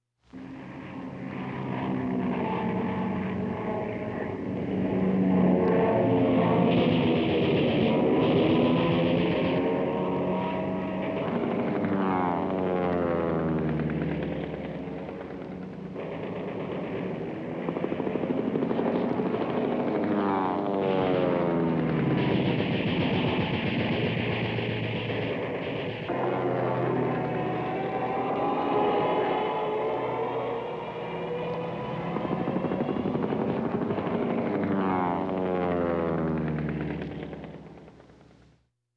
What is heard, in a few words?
Dog-Fight, Airplane; Stereofect